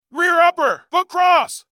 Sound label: Rear U Hook Cross